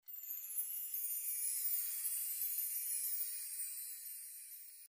zvezdy mercaut

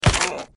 урон; CS:GO-2 урон

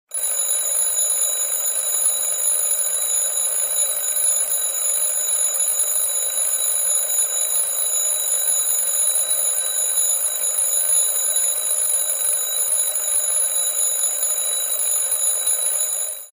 budilnik

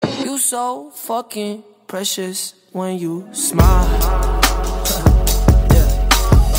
muzyka dlya donata

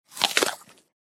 yabloko

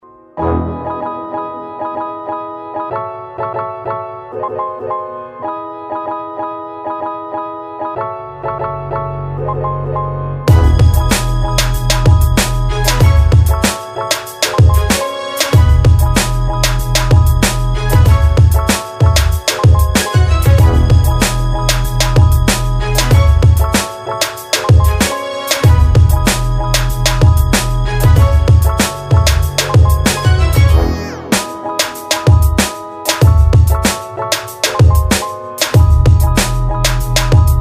populyarnaya vlogovaya muzyka

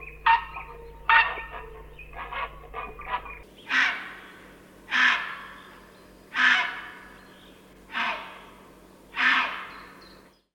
caplia

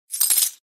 zvuk vzyatki monetami

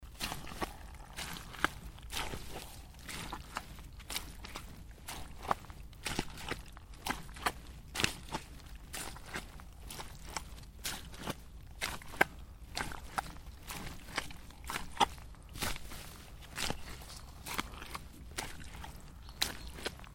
shagi po bolotu

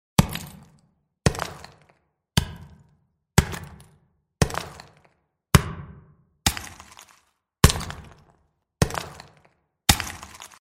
zvuk otkalyvaniya lda toporom

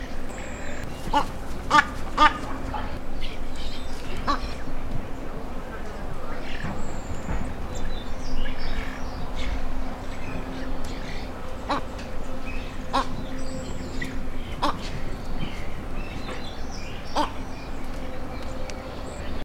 ibis krasnonogiy

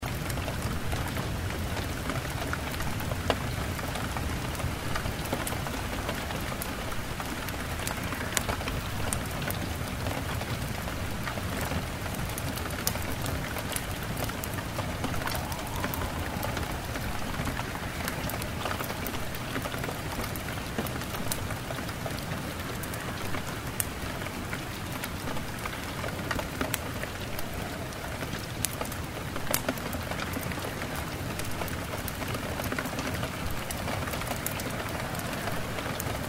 dojd za oknom; шумом дождя, падающих капель

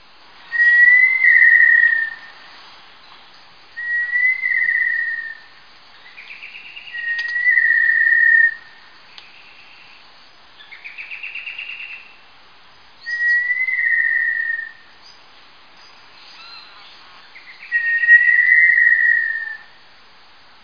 pestryi tinamou

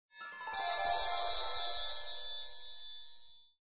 effekt chistoty

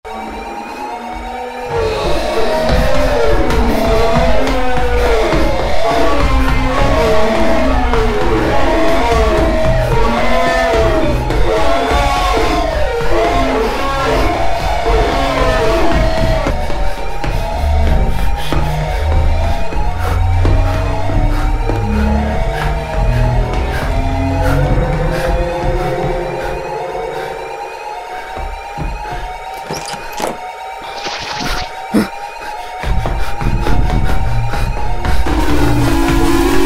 zvuk iz zakulisia

